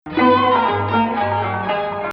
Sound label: Rast Rast Octave